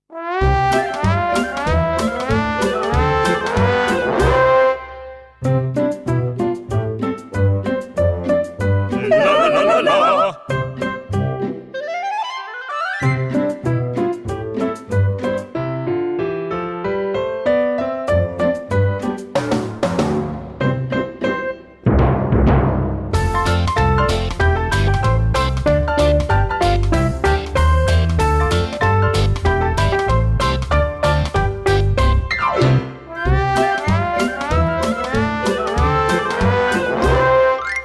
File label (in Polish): akompaniament